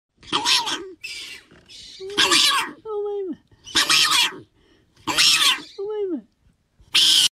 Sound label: hyrax scream